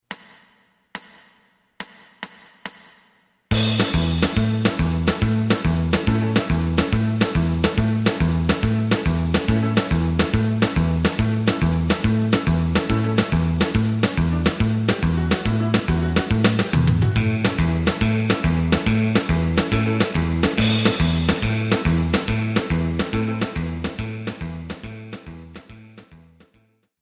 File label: lead